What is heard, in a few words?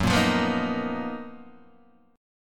F sharp Major 13th Guitar DADGAD